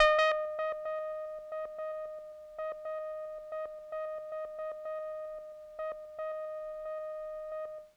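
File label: Kawaii D#5; keys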